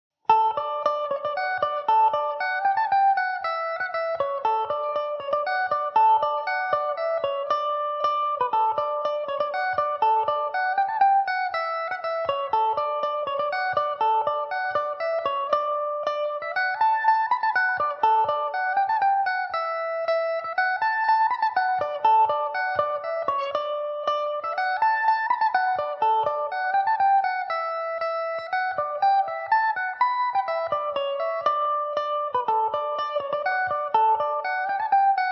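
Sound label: D major mandobird, irish mandolin; The Tiny Butler; Epiphone Mandobird VIII electric mandolin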